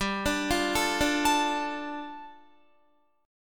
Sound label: G 7th Suspended 2nd, Guitar; G7sus2 arpeggiated